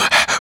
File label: Human Perc